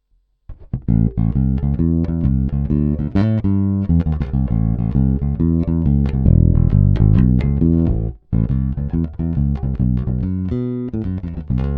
Fingerstyle Neck PU

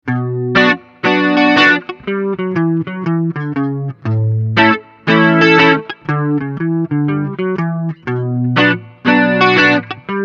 Cube-80X JC Clean + Chorus + Spring

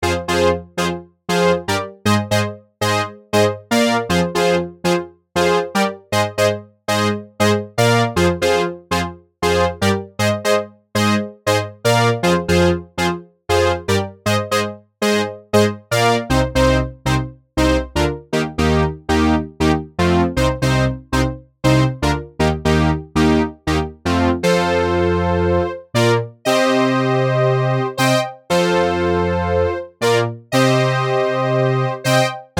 Anthemic Brass 2 wet

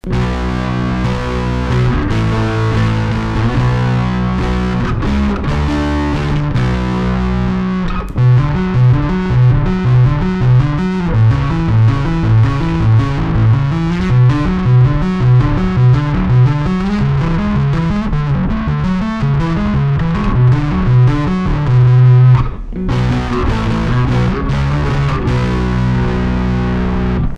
metal drive full metal drive; EBS

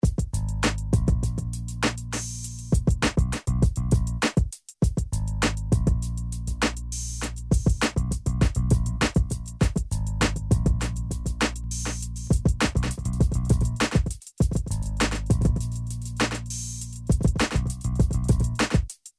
Loop Delay

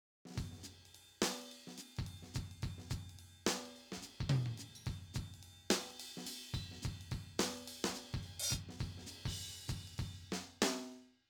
Simmons SD1200 Classic

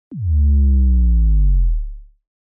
Bass Drop

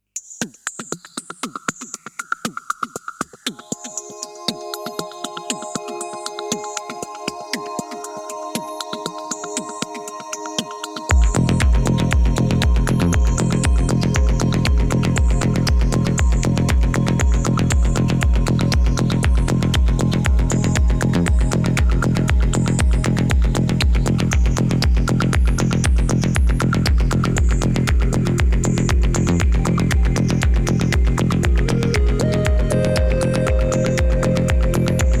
Electronica track; ATTACK 12 RELEASE 12 Ratio S

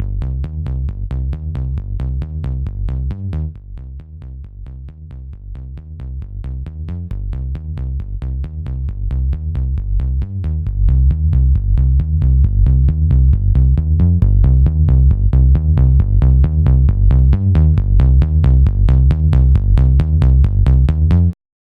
UA Hitsville EQ Bass EQ

